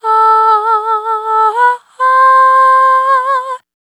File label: Shared Faith Dry, vocal samples; Sonic Foundry (Sony Creative Software) - Pocket Diva World Class Vocal Samples; Op-Erratic Loops